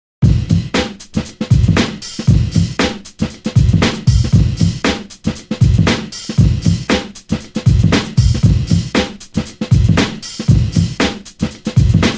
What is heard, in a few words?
Shot Gun Bonus Beat